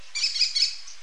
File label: american kestrel